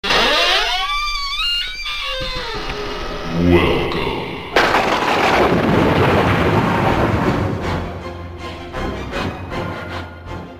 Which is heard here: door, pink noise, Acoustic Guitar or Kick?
door